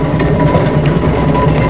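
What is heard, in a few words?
ROADROLL, road roller; TRANSPOR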